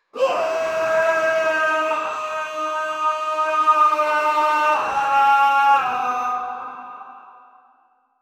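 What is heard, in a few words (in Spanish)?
un grito chamánico muy pic, shamanic; chamnico; sound effect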